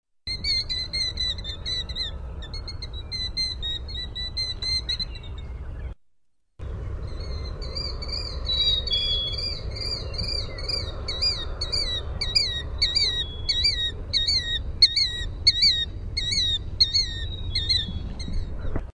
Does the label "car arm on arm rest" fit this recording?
No